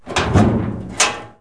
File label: Locker Open; City Life; Business